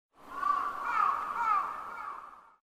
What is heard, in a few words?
raven; soundscapes